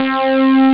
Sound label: Kickin mellow lead; jazz jackrabbit 1; M8